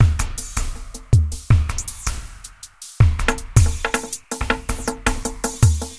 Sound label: DRUMLOOP, AMBNT, 160; Ambient